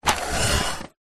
household furniture wooden drawer removed small metal jewelry inside slide wood